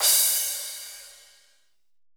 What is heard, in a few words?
LITE CRSH; Drums In Your FACE!